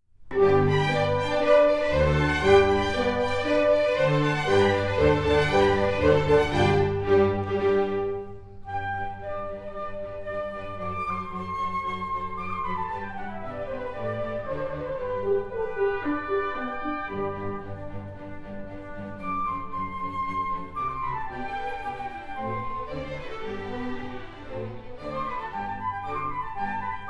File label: Flute Con G